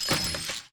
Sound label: reel nudge; trollpot